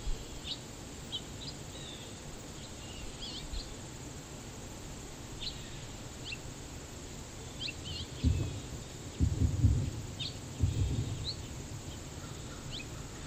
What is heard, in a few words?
Anomalous Environmental Sounds